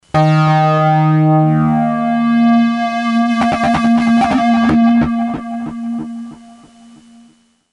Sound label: Alert; Electra